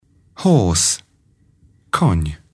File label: horse